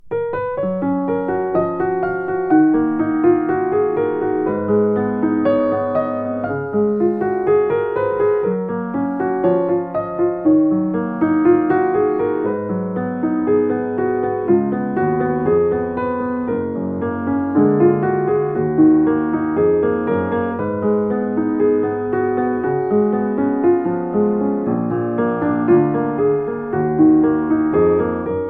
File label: Schlogl 123 exclusive, piano